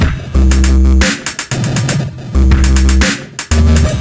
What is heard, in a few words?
dubstep loop; tum11; tum2011